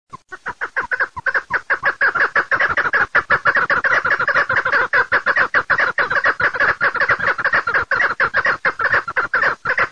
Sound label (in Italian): PERNICE, birdsound